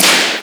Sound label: Claps; Vengeance Samples